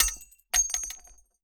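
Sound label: bullet case bounce 50bmg glass; gmod; viper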